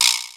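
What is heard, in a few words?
RX PERCUSSION; Kid Nepro; Elite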